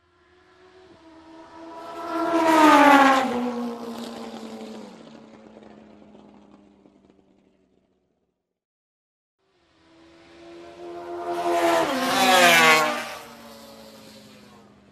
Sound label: Two ring motorcycles pass by; motorcycle racing